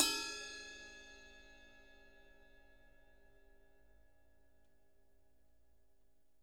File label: ride bell; Sonic Reality; Interactive Drum Kits; 90s sample cds